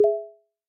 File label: Stock Android SMS